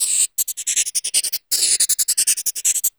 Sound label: LOOPS